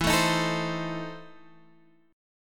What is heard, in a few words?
E Major 7th Suspended 4th Sharp 5th E down Guitar Drop D